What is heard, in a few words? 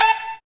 STR BOW